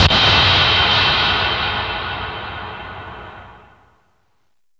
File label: SMASHEKO, SPACEY DRUMS; Spectrasonic Distorted Reality 1